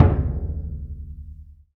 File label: AFRO DUNDUN, LRG DRUMS; Spectrasonics; Heart of Africa